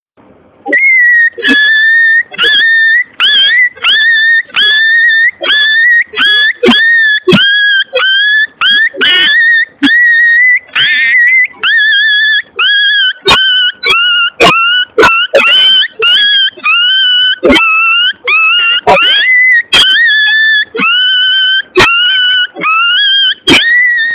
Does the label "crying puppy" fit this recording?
Yes